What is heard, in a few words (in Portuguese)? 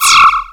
ruido alien efeito sonoro